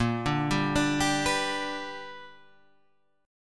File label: B Minor Major 7th Sharp 5th, chord